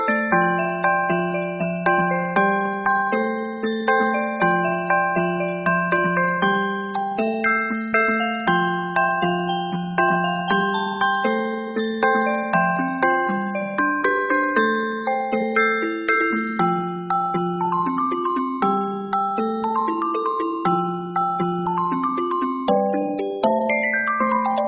Music Box

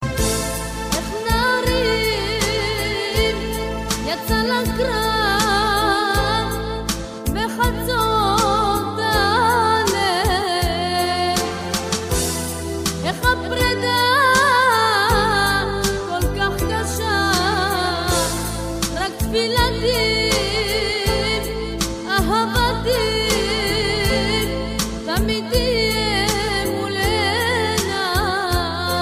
Arabic

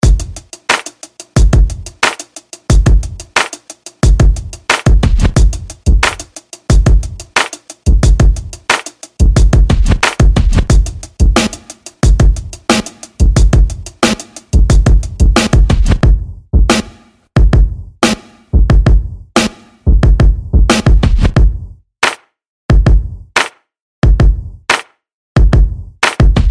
Hiphop Boy Sms

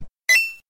Ding; Mario

